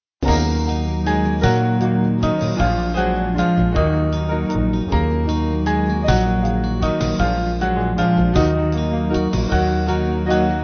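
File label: Band, Music Backing; We Three Kings; Hopkins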